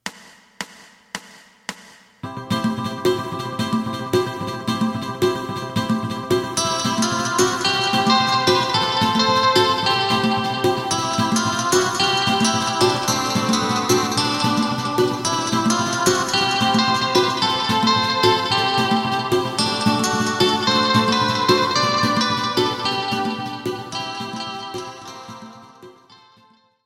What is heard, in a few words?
bass